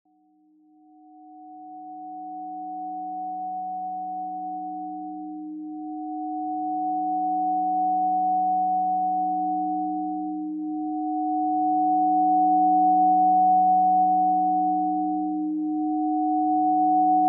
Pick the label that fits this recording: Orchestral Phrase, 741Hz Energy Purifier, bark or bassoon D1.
741Hz Energy Purifier